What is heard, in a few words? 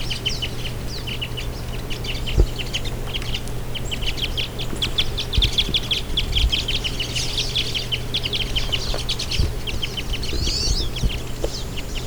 Red Crossbill; Hancock